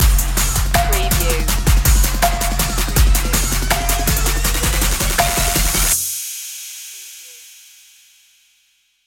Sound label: trap this stinger